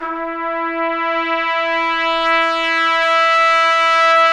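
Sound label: BRS TP SWE, Trumpets Swells; Roland L-CDX-03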